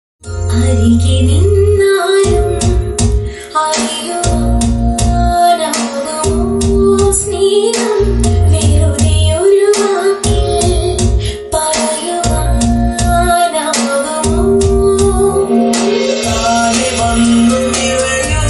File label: tamil love bgm malayalam romantic bgm; Trisha; Nayanthara